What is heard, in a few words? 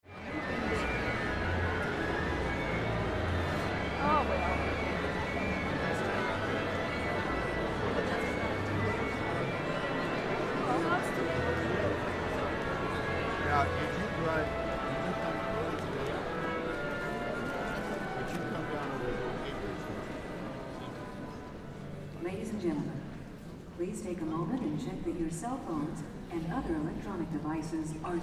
audience preconcert